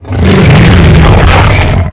Dragon Growl; Playable Tutorial Level; TR2

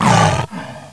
Bear Takehit; Playable Tutorial Level; TR1

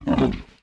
Attack

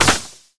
Mummy Jolt; Exhibit; Playable Tutorial Level

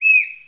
Whale Call; Playable Tutorial Level; TR3

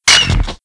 Laser Misfire; Playable Tutorial Level

